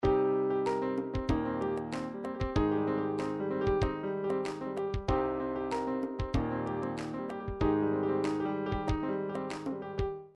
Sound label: triphop; Newtronic Triphop Construction Kit